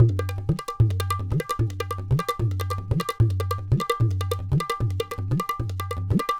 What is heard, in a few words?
Tabla, 150